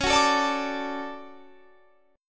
D Major C sharp, guitar

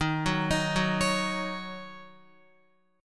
D sharp Minor 7th, Guitar; arpeggiated; JGuitar

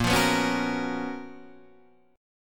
Gb Major 11th

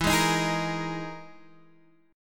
E Major 7th Flat 5th E down Guitar Half Step Down

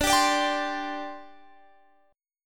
D 7th Suspended 4th D Guitar Standard; strummed; x,17,17,19,20,20; capo=2

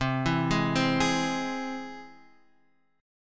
C Augmented down Guitar Half Step Down

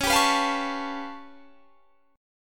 G 9th Flat 5th C sharp

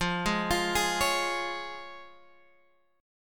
F Minor Sharp 5th, Guitar Open G, chord

